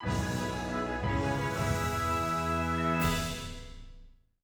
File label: Piano